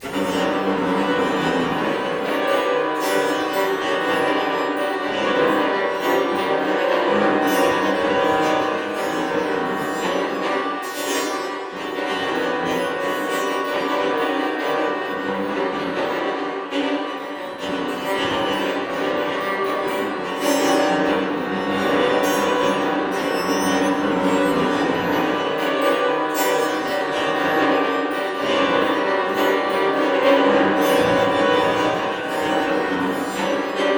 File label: Strings; Xenakis